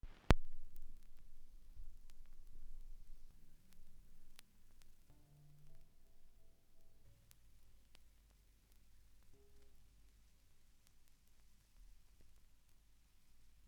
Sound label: Surface Noise Before